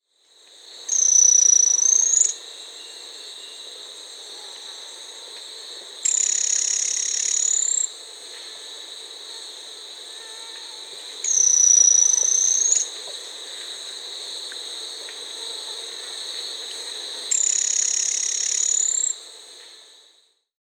Golden-crowned Spadebill (Platyrinchus coronatus), Peru; S. America